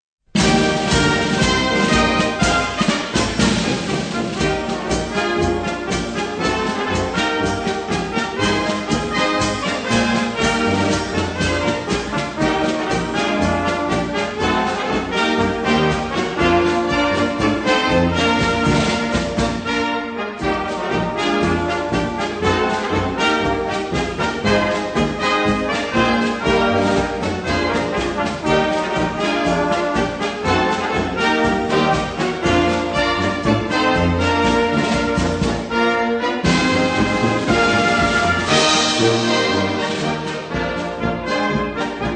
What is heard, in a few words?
Bells Of Brass